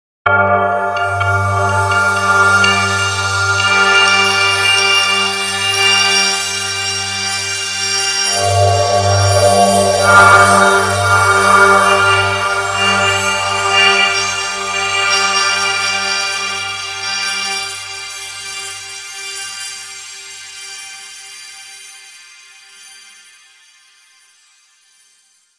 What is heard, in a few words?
Bed Shimmer Pulse Sweeper; 5.1 STEREO MIX; Blastwave